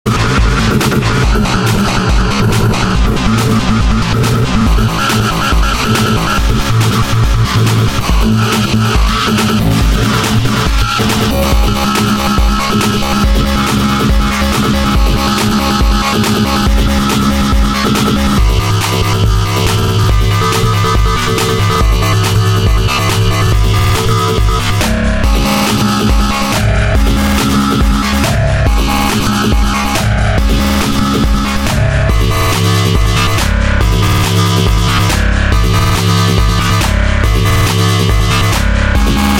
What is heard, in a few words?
bike flanger